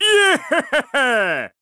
Laughing; Gondo

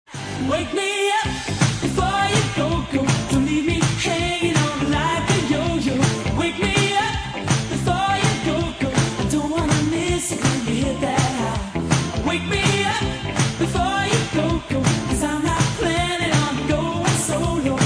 chorus

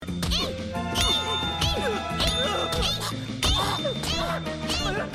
Flamenco Girl

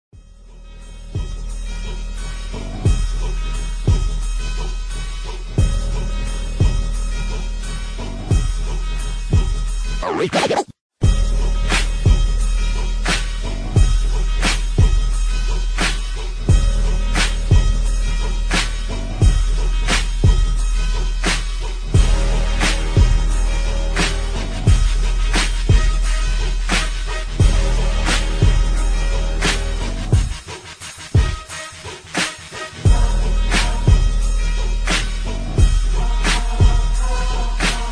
instrumental no hook

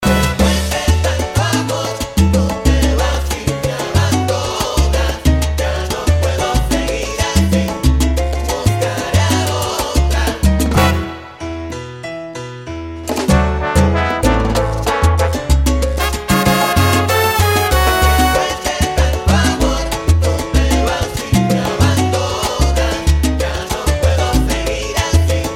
Salsa

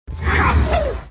kaboom; od duty black ops 2; zombies mod

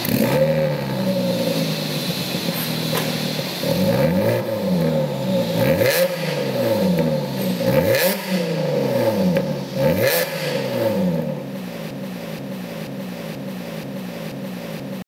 Car exhaust

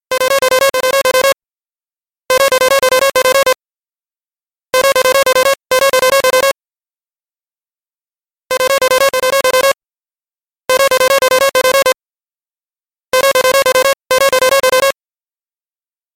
Galaxy S4 Cell Phone

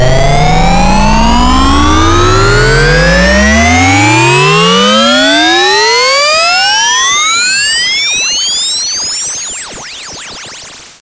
SUPER SIREN

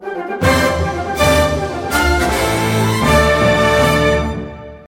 Mission Clear

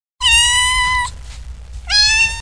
cat message; animals

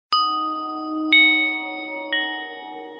bell notification

